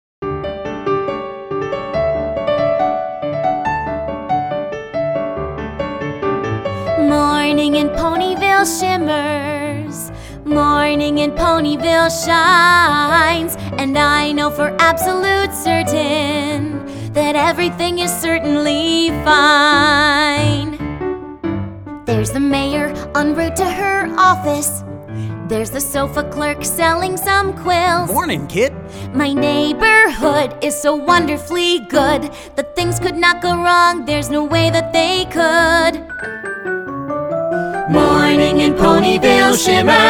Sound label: Piano; My Little Pony Friendship is Magic; Season 3